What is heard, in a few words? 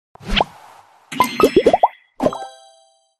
logo